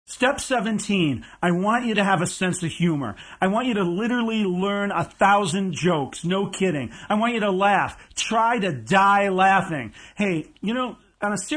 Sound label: Laugh More; GetWell